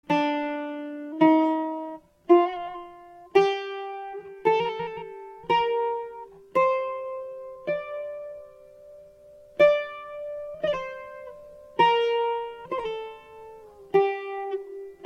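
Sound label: maqam bayati D Buzuq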